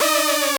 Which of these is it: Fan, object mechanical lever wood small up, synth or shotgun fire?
synth